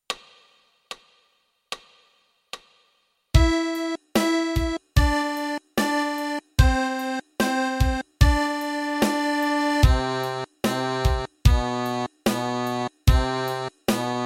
Il ritornello, fisa

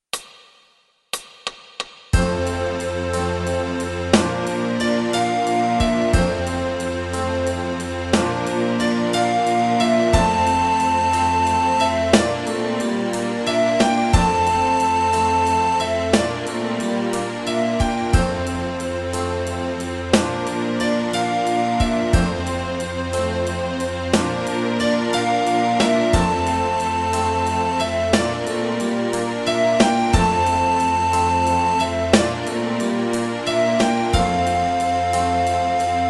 Piano tutti

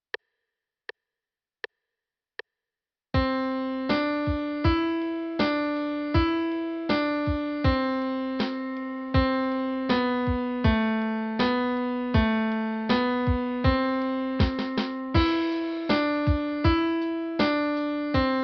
Tre note

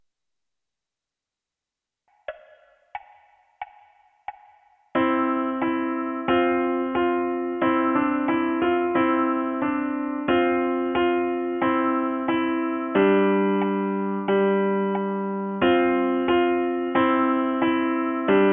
piano

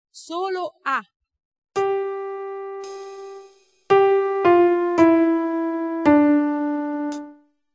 ritmo 2/4 solo

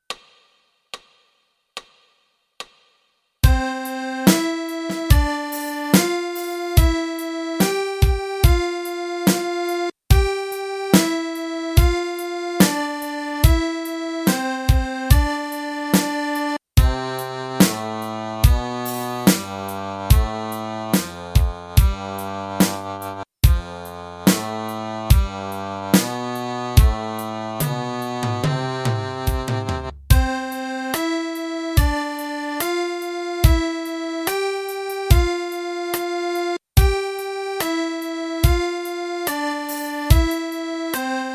Intervalli

